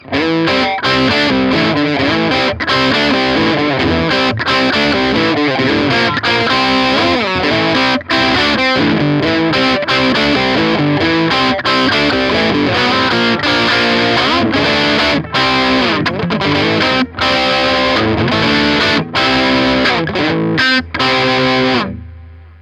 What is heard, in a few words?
Crunch Bridge Pickup